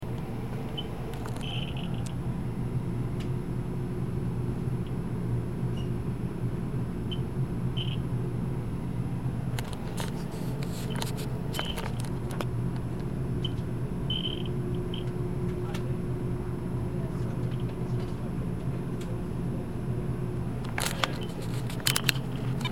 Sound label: Acoustic Release Pinging During Test